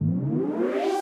Mission Meter

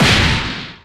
Fistball Hit

